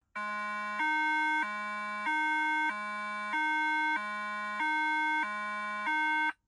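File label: Hi Low, emergency vehicle safety warning; SUBTONE; 911 Signal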